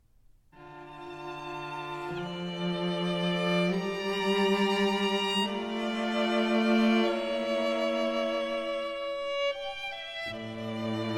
Quartet For Oboe, Violin, Viola and Violoncello in F Major Adagio; Chamber Works For Winds And Strings